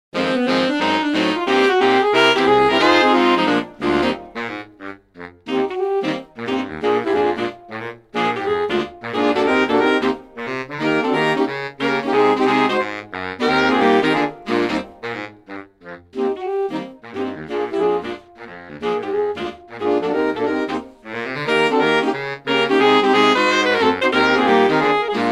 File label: SAXBAND Morning